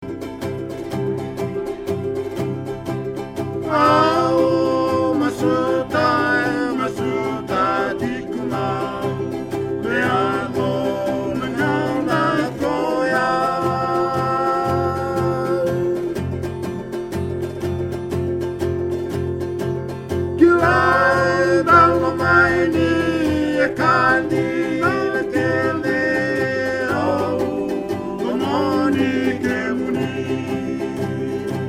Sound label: Band, fiji; Marlin Bay